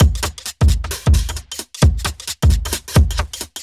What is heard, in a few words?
Beat Mod 132bpm, uk garage